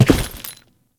Step Default, Footsteps; Alad V

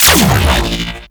Laser Spin Ability; Warframe; Corpus; Ambulas

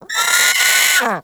Sea Bird Vocal Alerted; Characters; Warframe; Ostron; Hunting Critters

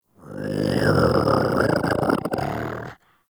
Combat Vocal Idle; Warframe; Catbrow

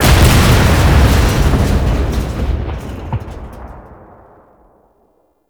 explosion; Rusalka; Outro; Warframe; NineteenNinetyNine; Stage8ShitboxConvoy

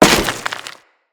Crewman Step Dirt, Footsteps; Enemies; Corpus

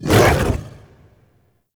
Axe Weapon Swing; Wolf Frame

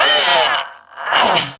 Death, Barks; Kuva Lich; Persona C

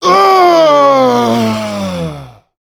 Death; Warframe; Vem Tabook; Battle

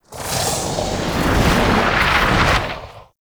Void Angel Teleport Return Build Up; Abilities; Warframe; Zariman

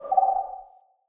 Cave Creature; Caves; Glades Of Andurin

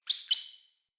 Rat Trapped Call; Ambient; Vocals; Verminia Messenger Rat; Animals

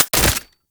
AK47 Mech; Warframe; Tenno

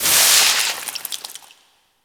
Ocean Door Open Drips; Warframe; Grineer Ocean

